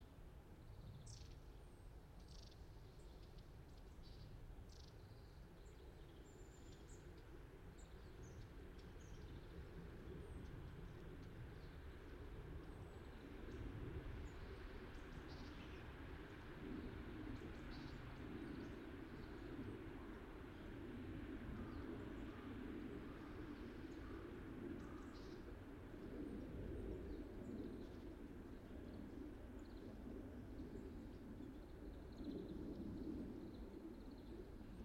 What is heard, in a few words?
yamanakako sound